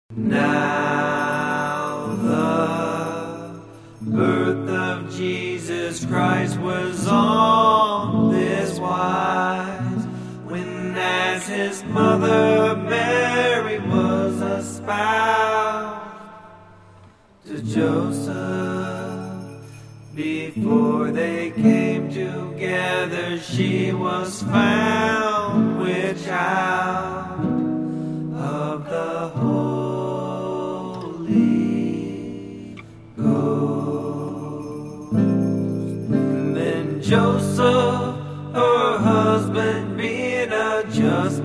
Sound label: Acoustic